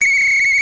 pfiff

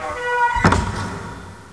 Kü Tür zu

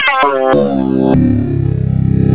Synthi

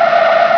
reifen start; gp500

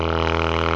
KUTTER; gp500

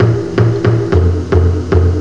pauken loop; SIKH

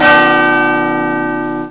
PIEPS

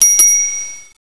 klingel; holiday

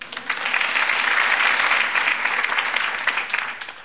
Theater; Anno1602

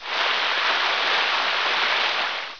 Brunnen; PC Gamer IT CD 48; 1602 AD; 1602ad demo

